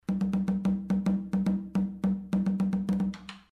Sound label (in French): congo laye caja variante